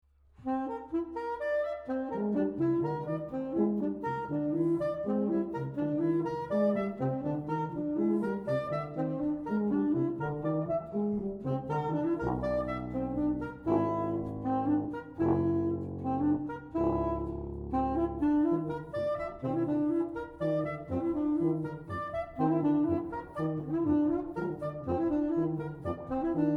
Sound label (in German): Spieluhr